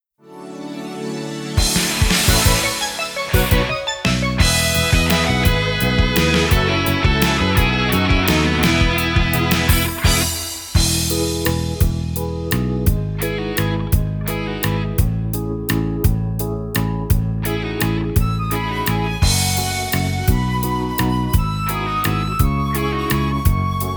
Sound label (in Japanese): ツンデレ・ワルツ Instrumental; hook memorial sound collection